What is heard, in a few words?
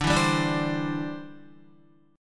D 7th Suspended 2nd Suspended 4th, Guitar, D7sus2sus4 chord; D7sus2sus4 strummed